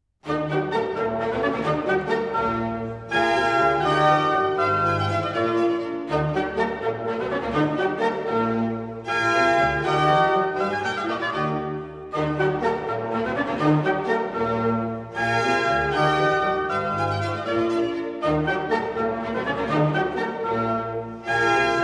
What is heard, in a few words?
Symphony, Presto; Symphony No. 1 in E flat major, KV 16; Mozart; Symphonies